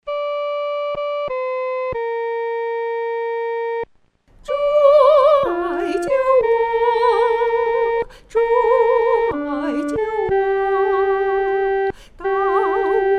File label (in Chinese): 独唱 第一声